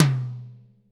TOM TOM, ACOUSTIC KIT; Greytsounds VOL-1

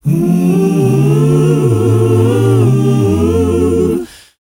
mm choir, S. African world voices; ILIO; Vocal Planet

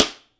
STICK, Drum 1 Dry; East Collexion

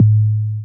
Kicks, Elektron MachineDrum SPS1 MKII

